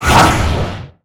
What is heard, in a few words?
gnome attack; Akeron